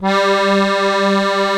DIATONISCH, AKKORDEON, Alpine Volksmusik; Best Service

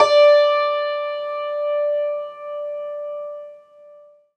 HACKBRETT D2; Alpine Volksmusik

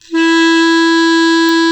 KLARINET F#2, CLARINET

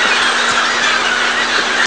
chor; ST-XX Amiga Soundtracker Sample Packs

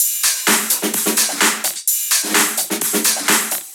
Drum Loop 128BPM FULL ohne BD

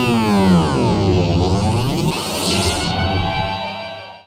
RAUMSC; Best Service; Advanced Mega Effects

